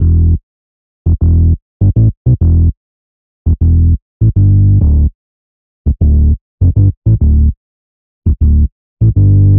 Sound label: E Bass Rumble Woofer, 100; Zero-G; Future Wave